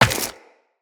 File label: Run Rock, Footsteps; Tenno